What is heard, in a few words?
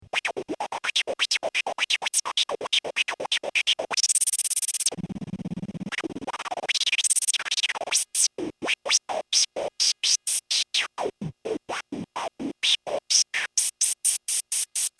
noise trans only